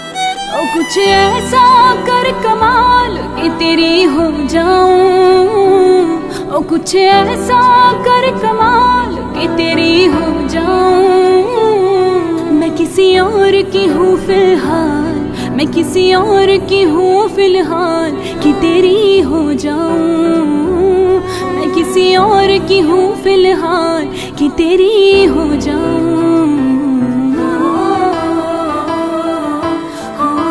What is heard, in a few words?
female version ringtone